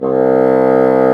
BASSOO, Bassoon; Brass&Woods

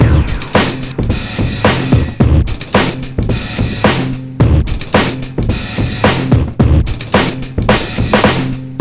HIT THE BREAK, 109 bpm; POTBreaks

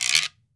GUIRO, Cuba; AKAI S6000 CD-ROM - Volume 5

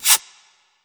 scratch wet; Q Up Arts; Denny Jaeger Private Collection

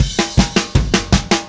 Happy Pub Beat Fill A; Sonic Foundry (Sony Creative Software); Indie Rock Anthems; Session 05 - Happy Pub

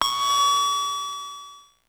Drum, Electro-Harmonix Spacedrum; Drums

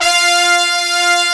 BRASS F4; FUNK

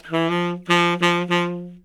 SAX LICK, BRASS LICKS; Ueberschall; Jam Box

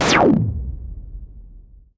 Noise Hit

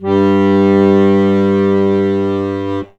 HOHNER, akkordeon

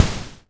hihat, Tama TS-305

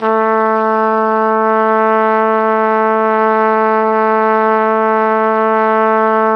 Flugelhorn; Roland LCDP12 Solo Brass

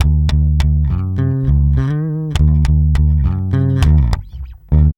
BASS, Funky Ass Loops

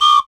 PRC Brazil Set; NorthStar - Global Instruments VOL-2

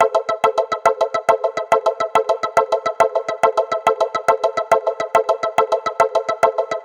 140BPM A; Vengeance - Essential Clubsounds Vol.4

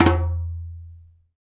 DJMB, African Perc; Spectrasonics; Supreme Beats; African Contemporary; SB Afro DRMs

